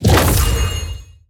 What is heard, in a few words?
moving box pack